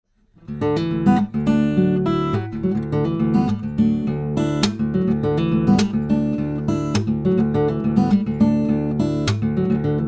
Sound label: studio projects C1